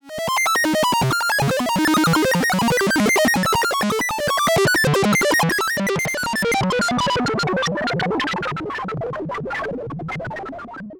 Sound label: random noise, mono synth; reaper